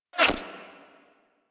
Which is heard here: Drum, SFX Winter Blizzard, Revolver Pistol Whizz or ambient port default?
Revolver Pistol Whizz